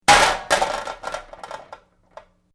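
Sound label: Metal Medium Impact Settle, Noisy metal junk impact, fall and settle; Noisy metal junk impact, fall and rattle. Tools or metal parts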